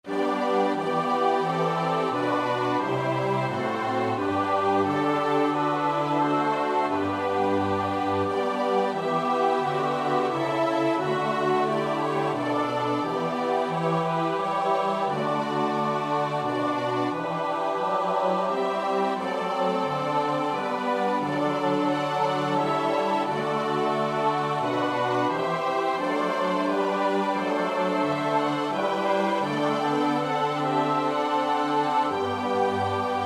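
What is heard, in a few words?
soprano alto tenor bass; Bach (Johann Sebastiaan); BWV 079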